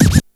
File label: scratch, Yamaha RY-8